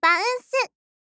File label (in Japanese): バウンス